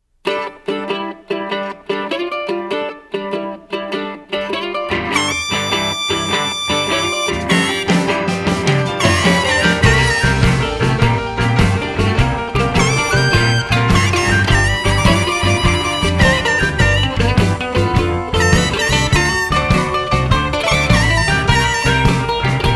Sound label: Mandolin